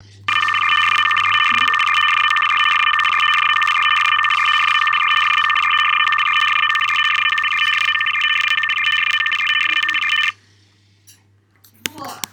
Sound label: hell; on4cko